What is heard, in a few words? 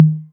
tom mid, Novation Drumstation